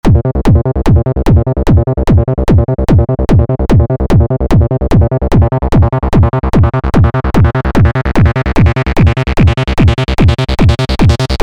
Trance Presets; Abx3; abx3 trance presets vol1

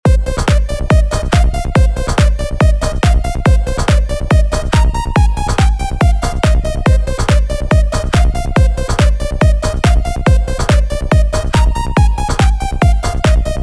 EuroWave Lead; Rolling-Roll; neo retro 8 bar loops

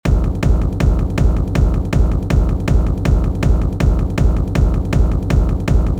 hard techno kick; continuous diagnosis; hard techno kick generator

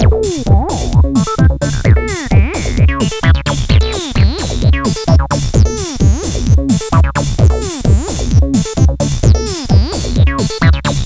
Cluster Acid; 100 acid vol 1

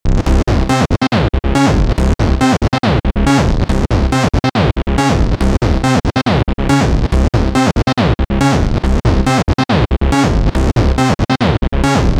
Bass Gater Techno; abx3 trance presets vol3

